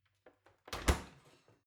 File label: oven close baking sheet ringing soft